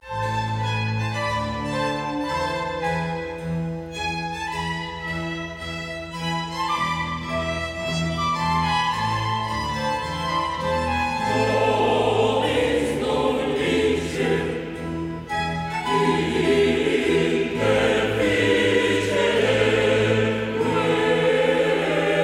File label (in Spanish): Coro; Nobis non licet interficere quemquam